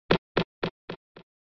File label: SNES RPG Stairs